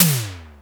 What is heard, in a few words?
misty, Toms Electro